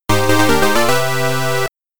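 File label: game over music; Grobda; arcade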